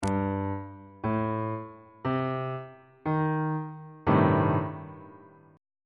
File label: b sharp minor 6th 2nd inversion on bass clef